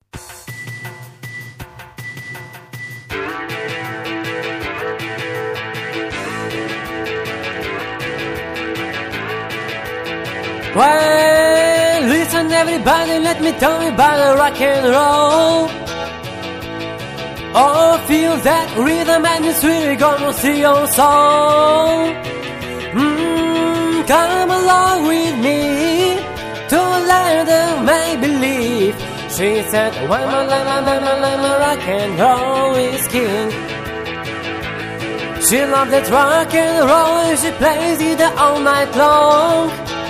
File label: Rock n Roll